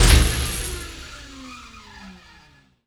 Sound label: mohole beam end